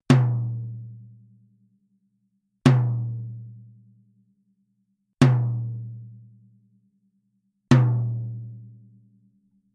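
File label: TOM Genera Reso Medium, drum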